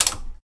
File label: stove OFF; DeadFright